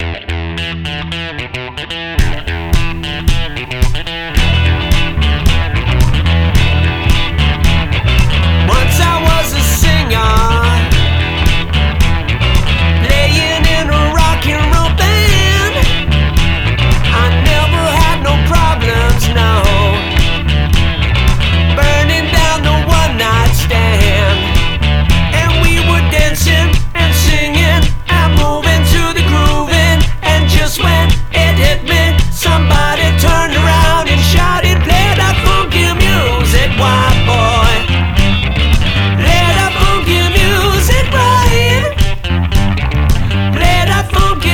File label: Funky Music